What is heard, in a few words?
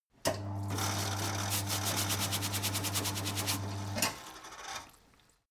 mechanic sink running short brush metal